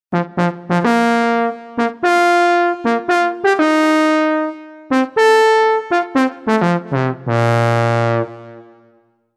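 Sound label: Brite Horn; TURBO VL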